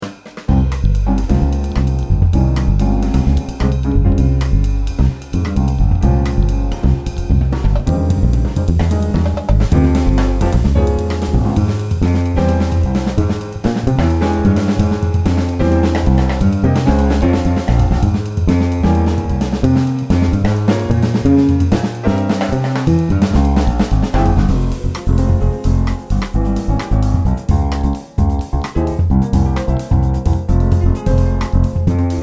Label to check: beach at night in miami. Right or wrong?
Wrong